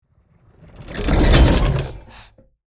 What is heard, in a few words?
Transitional vintage impact with air release